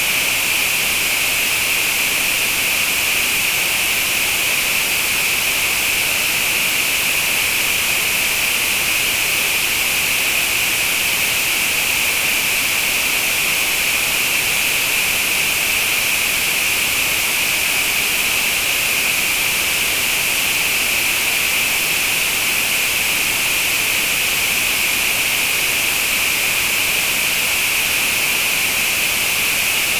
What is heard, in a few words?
2533Hz gaussian 13dB SNR